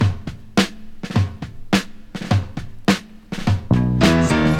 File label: 104 bpm breakbeat g key, drum loop - kick tuned to the G note. Loudest frequency: 913Hz